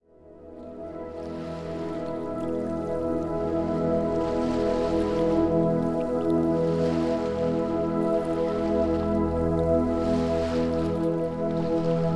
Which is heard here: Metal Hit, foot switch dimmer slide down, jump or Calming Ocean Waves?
Calming Ocean Waves